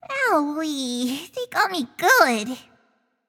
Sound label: death; smite; scylla